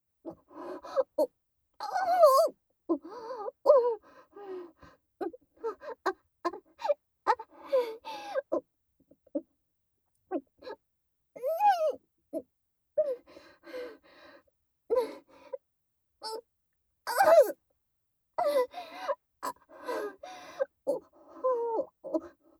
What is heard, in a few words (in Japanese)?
スパンキング喘ぎループトラック, 効果音なし, ASMR; あなたの匂いが好きすぎる後輩チアJKとの媚び媚びチン嗅ぎご奉仕部活おわりえっち